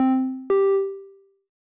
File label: sonification beep start x2